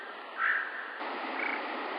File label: Unknown and Dickcissel Half Speed